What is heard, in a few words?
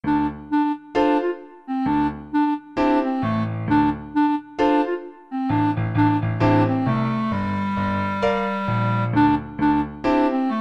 hip hop house, clarinet